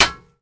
impact metal stick light; sound effects one

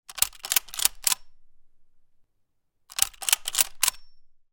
gun mosin nagant; still north media

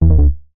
game tone error

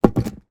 industrial traffic cone heavy movement down; zapsplat

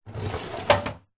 fridge drawer plastic close; household

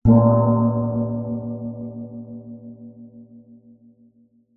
musical instrument gong small gamelan hit soft

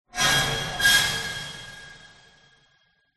sound design metal scrapes huge reverb horror

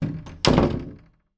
household door glass panel internal shut; sound effects one

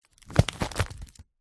book folder plastic wallet style page turn; zapsplat

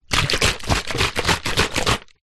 food drink smoothy shake bottle half; zapsplat

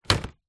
leisure toy plastic throw into plastic toy box; zapsplat

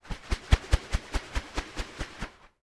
toy rubber spin swish through air; sound effects one

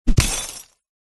impacts glass swoosh into glass smash

